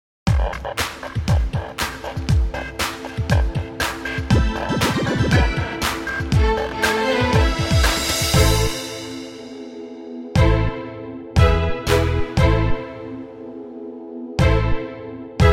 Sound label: modern piano minus